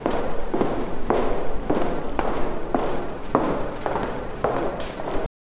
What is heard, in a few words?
warehouse